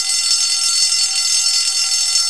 city fire bell loop

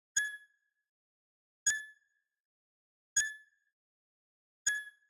UI Sound Pack Scroll; Kpow Sounds

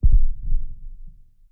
Explosive Hit Filtered, Low End; 344 Audio; Low Frequency Elements

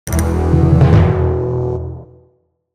Game Finish; Noisecreations; ARCADIA

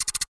pod hit; hl2

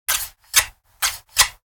Hand Shears Slide Open Close; Mechanical Wave; Sound Effects Collection

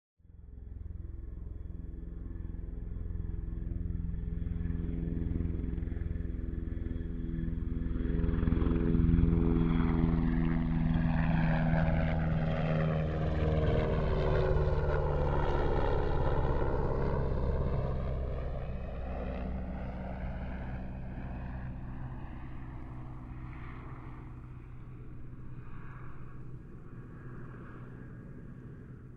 Helicopter - Military - FLY - By - Overhead; ORTF; MKH8040; Pole Position; The Aircraft Ambient Library

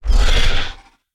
DRY Pterodactyl roar; Dino

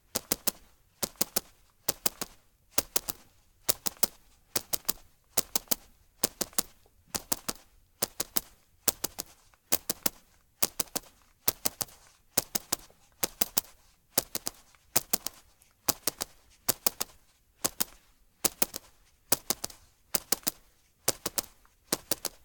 Tallons Concrete Gallop-3-Step, Creature Footstep; Joshua Reinhardt; Ultimate Creature Footstep and Movement Library; GDC 2020; Game Audio Bundle

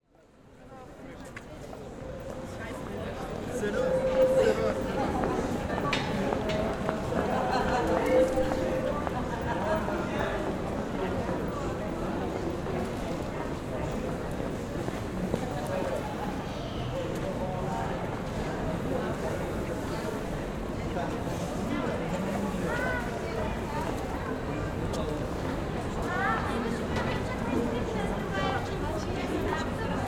City Street, Pedestrian, Ambience, Busy; Cityscapes And Streets

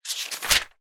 CATALOGUE Page Turn; Touchdown Audio; The White Stuff

